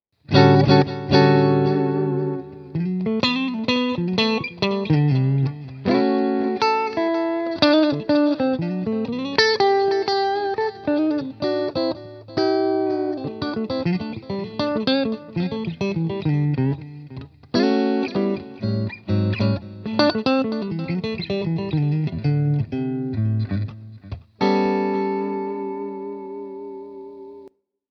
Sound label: strat hals u3 110 eq